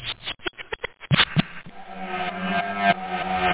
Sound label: garbage, effects; da capo vol1; instruments